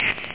hihat; da capo vol1; drums

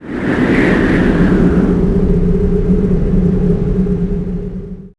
wind; PC Gamer IT CD 38; thief

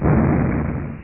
BOMB; PC World Komputer 1996 February; virus

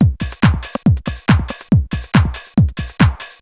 word, Techno Drums; TechnoMusicMaker

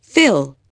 fill

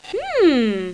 hmm; Tetris Collection for Windows 98

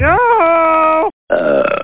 yoooooo-burp; barney

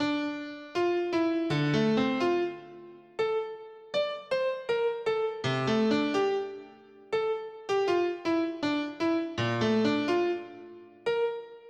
BAROQUE

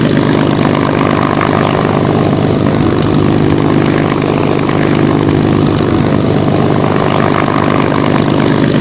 SpitFire; planearcade

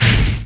Kamikaze Release; worms2

